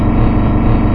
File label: generator work